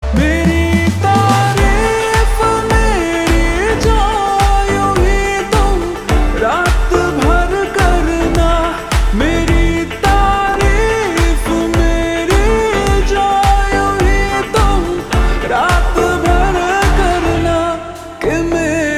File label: Slow Reverb Version Ringtone